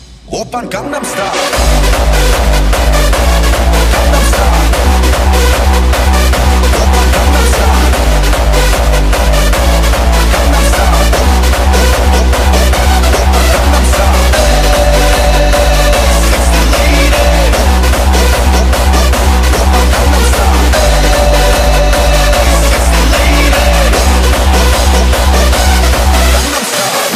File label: Hardstyle